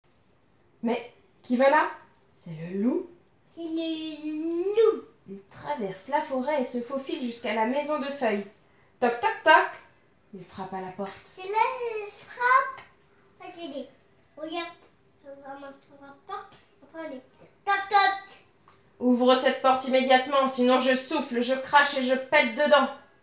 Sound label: Vocal